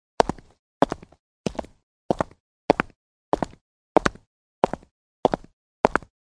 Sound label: Woman in high heels walking on wood